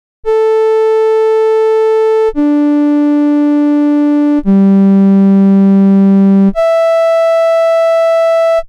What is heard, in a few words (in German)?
A D G E (rein)